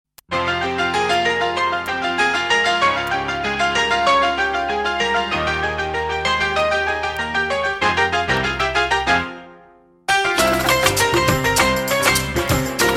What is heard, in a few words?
instrumental